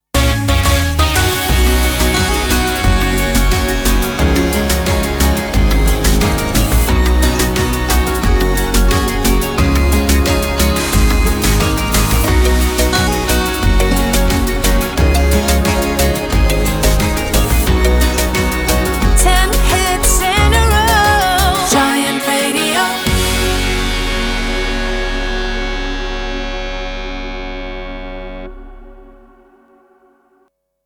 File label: Sung Jingle Radio 89bpm Fmin Ramp; Giant Radio